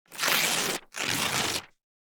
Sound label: paper rip